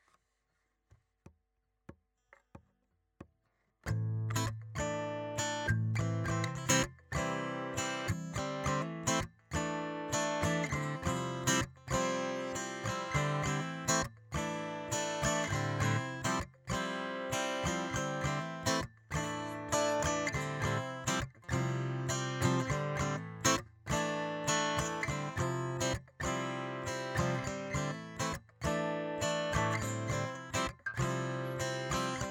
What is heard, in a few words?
Am Asus4 CM4 F4 Am Asus4